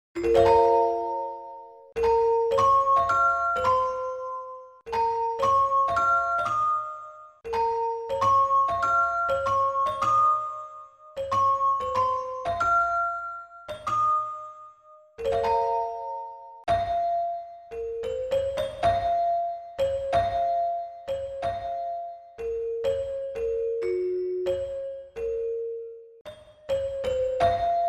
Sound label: Celesta